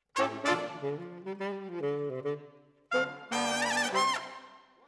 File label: Sax